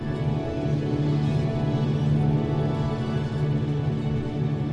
medium Win Loop; fairyred

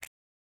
button up; fruitshopmegaways

